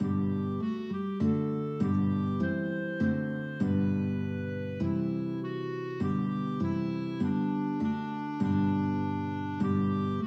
guitar clarinet duet

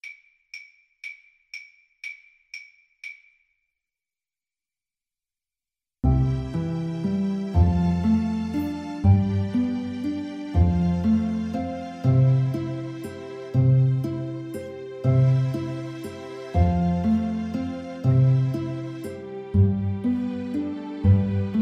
morning has broken piano

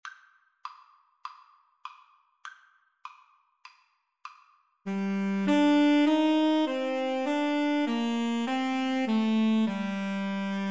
Tenor Sax Duet

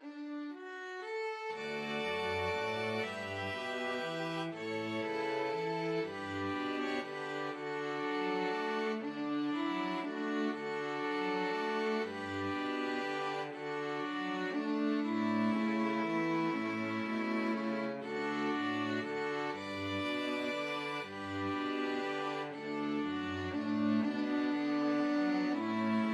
string quartet

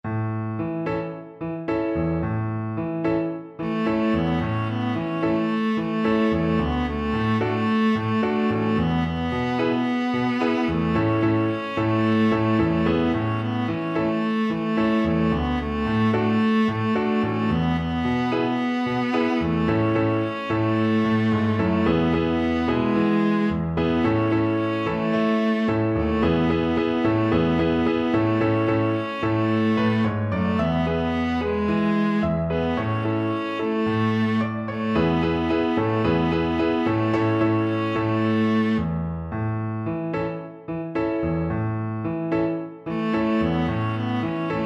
viola

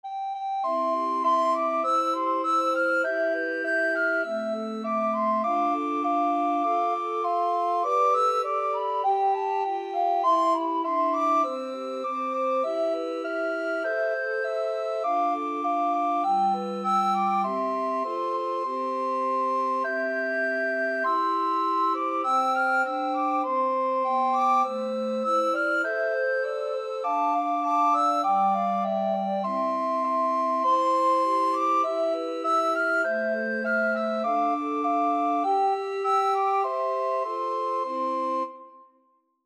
recorder quartet

